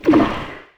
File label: paint blob inception; Portal 2